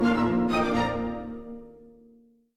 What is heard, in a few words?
Comical End 64bpm; asterix obelix slap them all 2; Music Dialogue